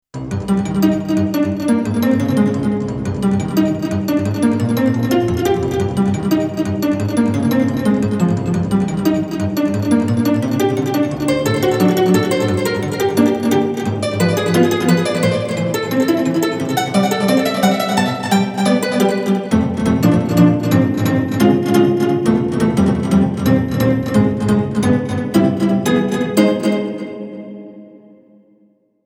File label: d50 pizz; v-combo vr-09